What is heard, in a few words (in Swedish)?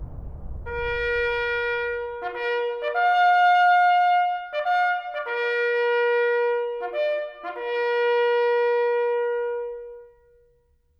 Flygvapnets igenkningssignal; flygvapenmusik